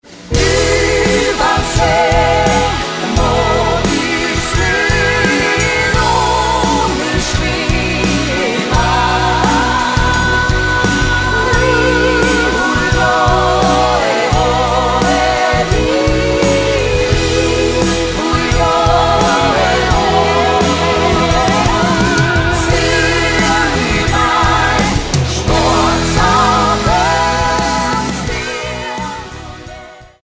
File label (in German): Duett